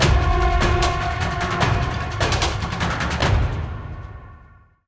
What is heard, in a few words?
Boss Combat End; Griftlands